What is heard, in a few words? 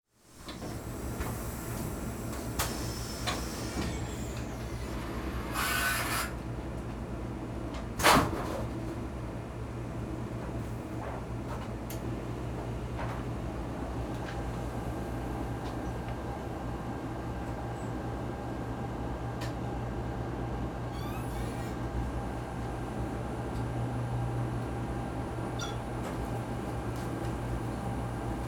Industry Ambience Press Room Plate Burner